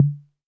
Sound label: Tom Lo, Roland TR-77; Drums